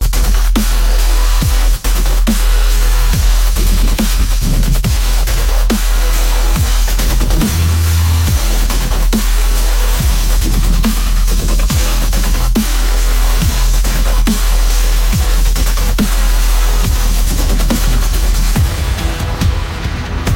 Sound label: dark beats